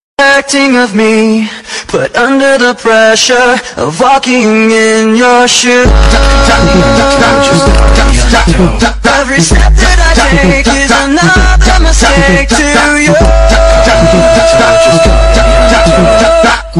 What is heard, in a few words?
funk